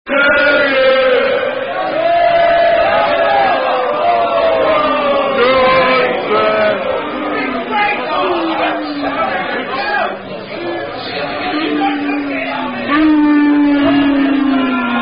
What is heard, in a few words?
Zulu Warrior Song Down-Down; How Not To Drink A Dirty Pint; Zulu Warrior Song Down-Down For Dirty Pint